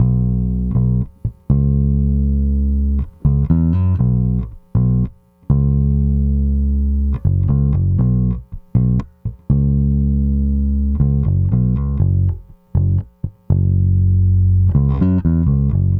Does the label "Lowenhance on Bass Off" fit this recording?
Yes